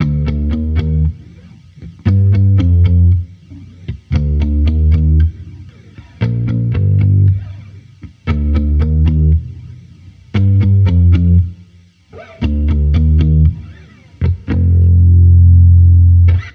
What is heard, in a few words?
Amped loop 116 Bb, Electric Bass Loops; Slate Digital BASSHOLE Sample Pack